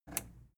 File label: plastic button scratch release disable